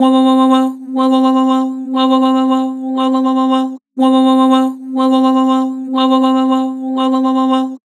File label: Flat Woah, Vocals; Harmor Vocals